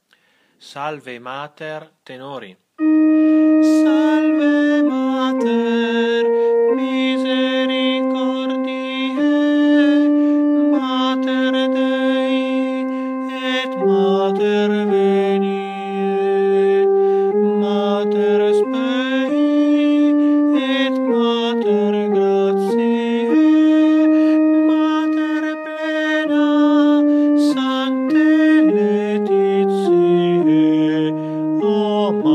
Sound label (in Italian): TENORI; Salve Mater